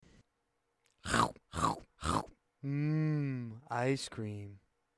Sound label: lemon alert